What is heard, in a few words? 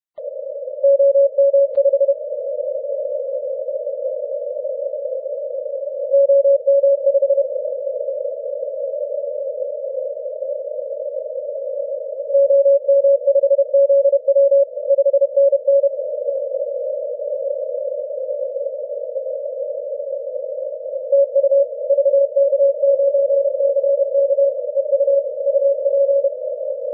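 10m Morse code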